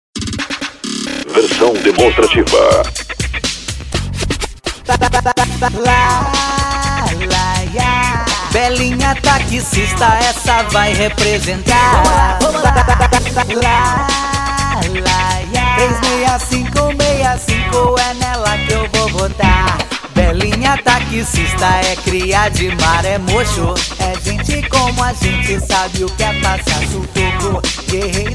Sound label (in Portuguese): Jingle Politico Pisadinha Funk Carioca; Jingle Politico 2020; Dança Da Vitória Para Vereador E Prefeito